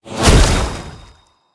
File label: activate; smite; god sol